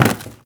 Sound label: Carboard Box Impact; Warframe; Ambience; NineteenNinetyNine